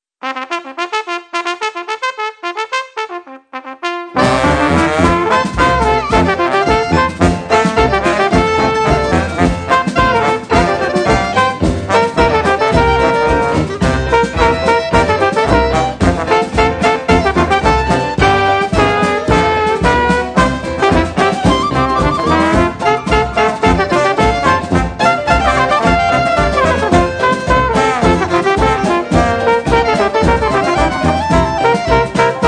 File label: jazz band bugle boys march